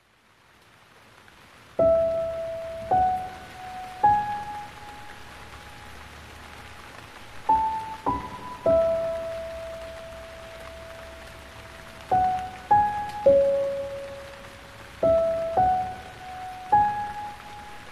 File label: Piano, With Rain